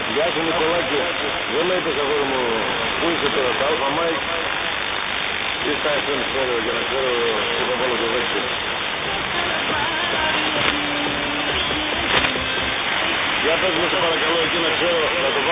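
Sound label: 1670 khz, pirate radio; AlfaMike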